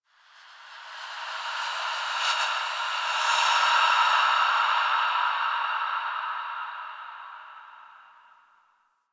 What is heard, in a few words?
Breaths; Ambience; Warframe; The New War; Quests; Zariman